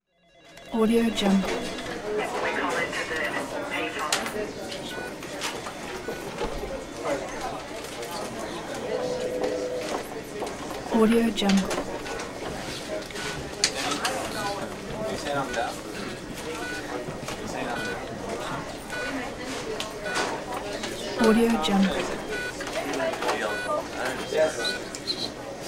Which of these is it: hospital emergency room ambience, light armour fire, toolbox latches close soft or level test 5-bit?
hospital emergency room ambience